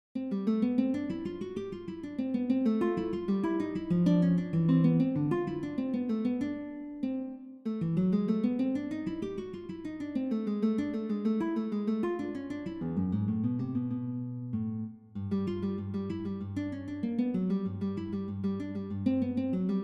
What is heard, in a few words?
Bagatelles, Solo Guitar